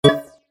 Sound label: System confirm beep